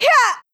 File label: combo, onehand sword; sura